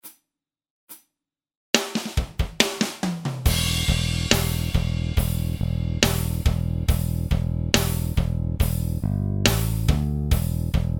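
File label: backing track slow; lick of the week no 24; irene ketikidi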